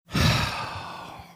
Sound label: sighing